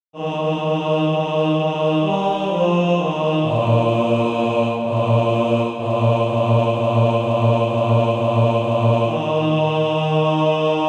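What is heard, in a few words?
bass, Practise Music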